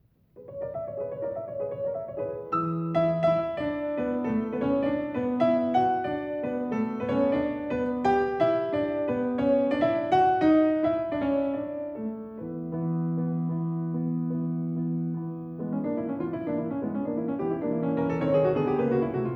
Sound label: Dances in Bulgarian Rhythm